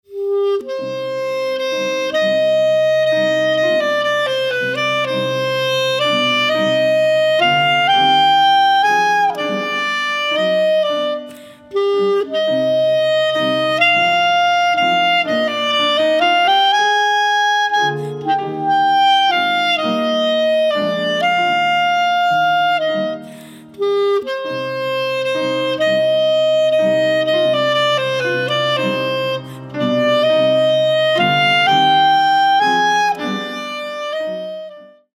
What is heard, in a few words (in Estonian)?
saksofon